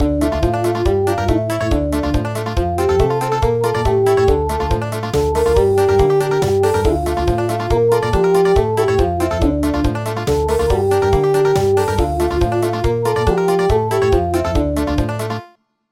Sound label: Country; A new joy has been revealed